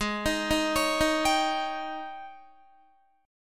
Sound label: Ab Major 7th Suspended 4th Ab Guitar 4F,4A,5C,5G,5C,6E; arpeggiated; AbM7sus4; JGuitar